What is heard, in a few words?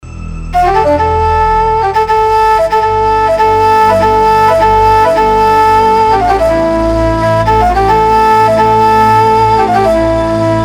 KAVAL; nefesli